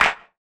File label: clap; CLAP-HIP; Best Service; Dance Mega Drums